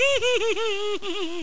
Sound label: giddy laugh; Atomix VirtualDJ 8 Pro